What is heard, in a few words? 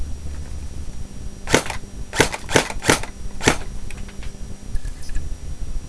steyr